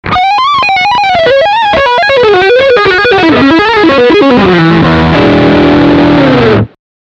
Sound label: Fast Lick Middle posit